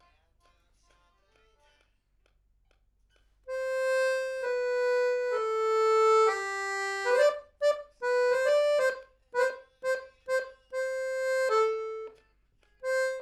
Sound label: Accordeon MAIN